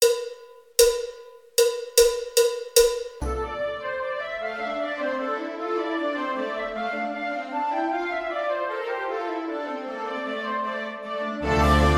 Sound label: Spanish Dance